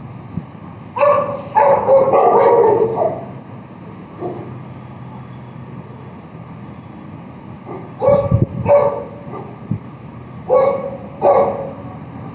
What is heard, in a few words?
bark samples